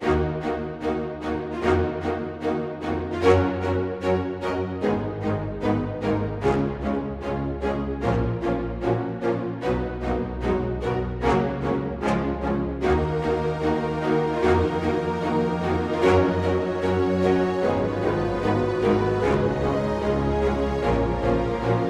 improvisation staccato attack

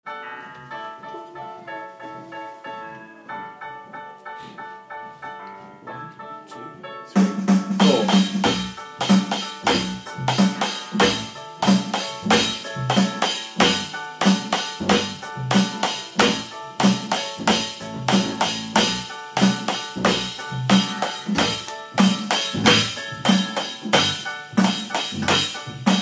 drums intro verse chorus

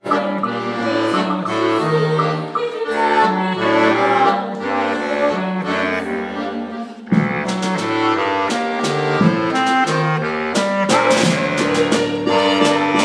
rehearsal band

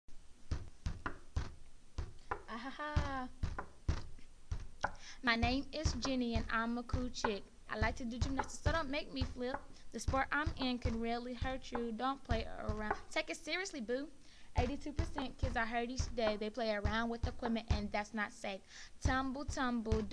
gymnastiic rap

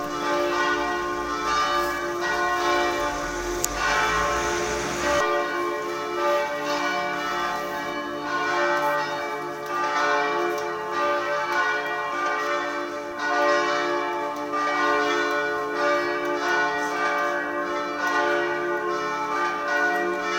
morning bells

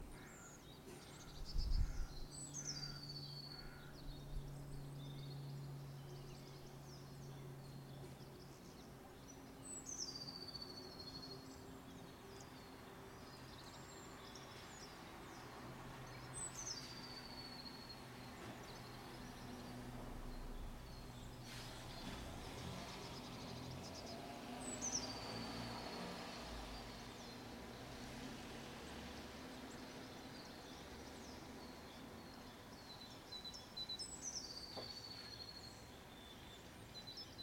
Sunday spring soundscape

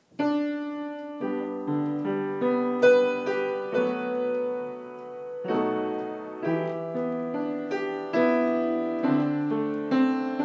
amazing grace jazz chords; courtesy of jazzedge

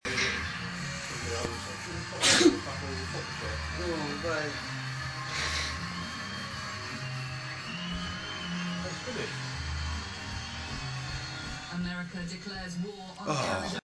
haaa chooo

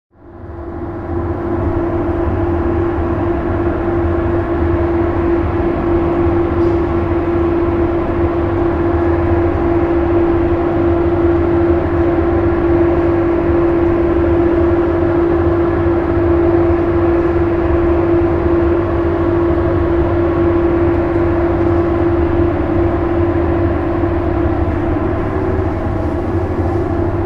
air vent outside

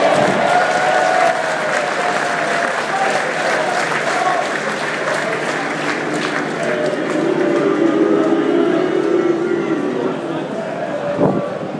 cheer, boo; warner

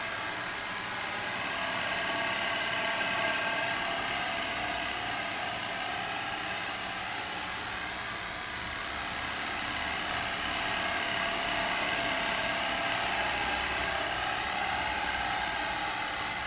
projector sound in birmingham art gallery

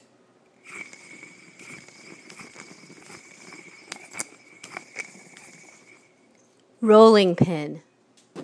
rolling pin, kitchen; sound semiotics of the kitchen

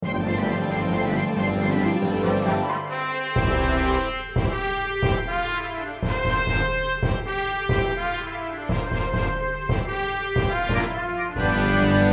star wars with backing track

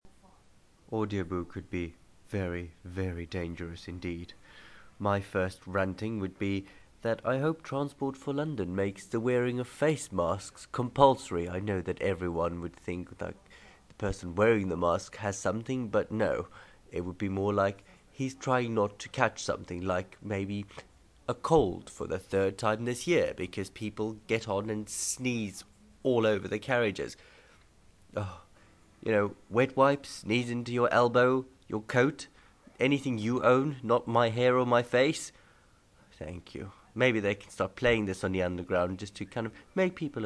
sneeze

